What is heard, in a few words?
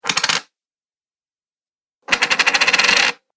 Coins Two Sounds, money sound effects